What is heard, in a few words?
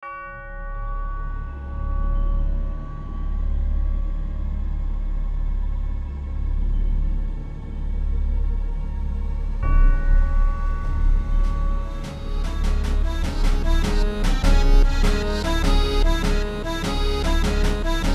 Little Marching Band; sans Fade